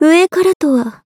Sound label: Overhead Hit; BBCF; Izanami